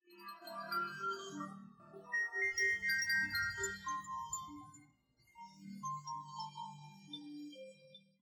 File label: Chimes